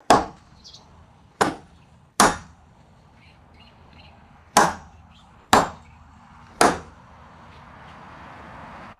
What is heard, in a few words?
rhythmic hammering outdoors with faint birdsong; tools